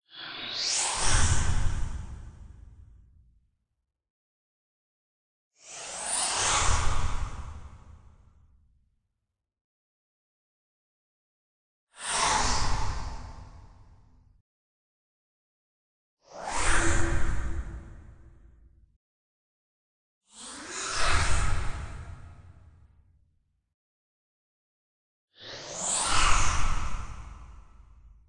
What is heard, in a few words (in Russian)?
7 variantov zvukov dlia bystroy smeny kadra